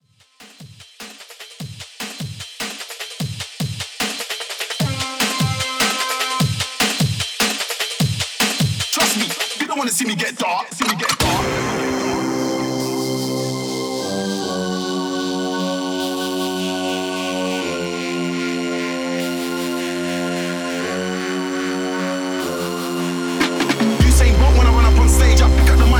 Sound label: Reload Time